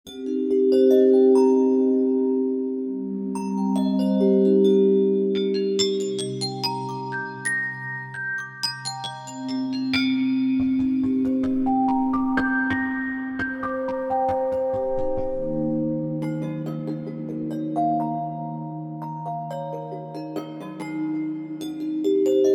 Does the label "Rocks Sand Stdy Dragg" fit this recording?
No